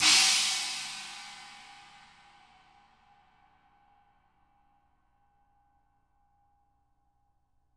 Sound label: CHINA 20, CHINA CRASH; ILIO; Double Platinum Drums 1